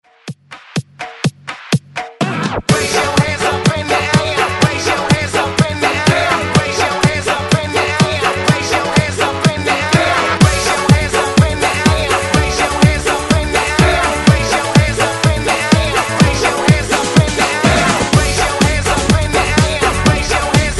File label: 90s Dance Hype 124Bpm